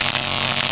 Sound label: electrc